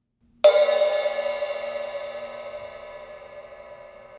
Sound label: Kerope, cymbal sound